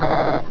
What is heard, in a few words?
BAA